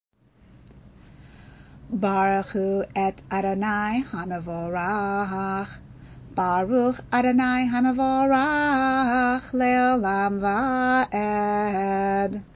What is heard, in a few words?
weekday barchu; weekday shacharit